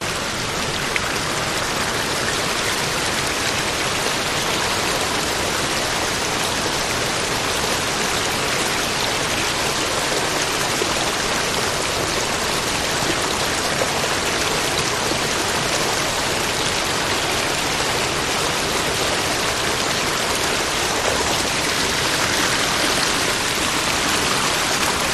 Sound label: creek waterfall in distance; bridal falls; your free sounds